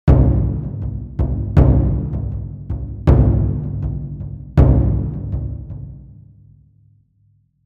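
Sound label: Lowered 2 semitones, Ohdaiko; TAIKO THUNDER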